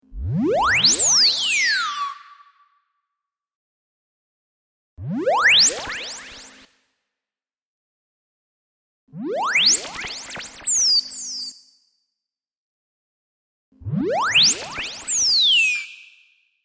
Oscillator Frequency, Retro sounding valve radio frequency tuning; Electrical; Sound Design